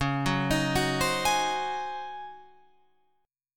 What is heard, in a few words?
Db Major 7th Suspended 2nd Suspended 4th, Db, Guitar, Open F; arpeggiated